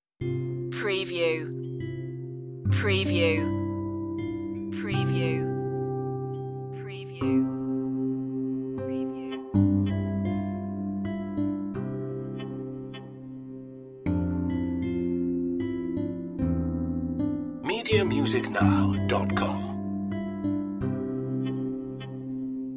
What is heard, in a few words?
brushstrokes playful